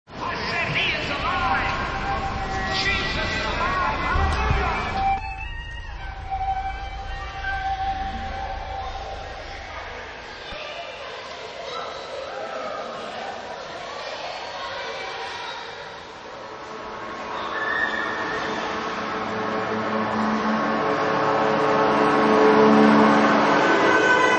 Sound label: Lexicon Electra Dub